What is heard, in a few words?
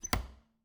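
Refrigerator, old fashioned, wooden, steel, brass clasps, hinges, open; Eiravaein Works; Vaeyan IV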